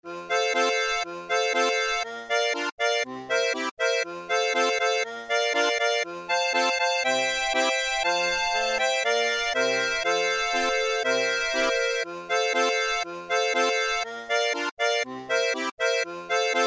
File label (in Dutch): akkoordbegeleiding aan melodiekant